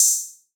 Open Hats

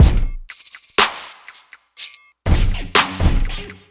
Loop

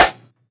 Snares

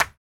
Snares

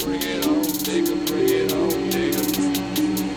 Instrumental; Prod. By Mr.Sisco

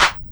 Creep Clap

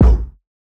LP Kick; Kicks

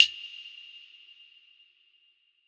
PERC

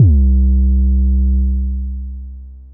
Bounce 808

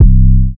808, C

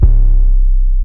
Wobble 808

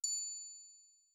Perc

